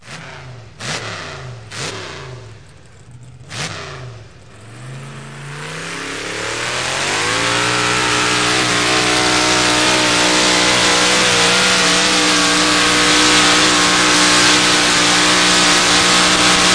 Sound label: tractor pull; Sound FX Real Life